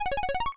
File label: complete, Retro Game Weapons Sound Effects